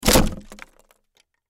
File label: Crash Wood Axe Remove From Wall Door; Crashes; Blastwave